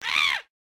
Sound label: hit; HEDZReloaded; hit sounds and general sound effects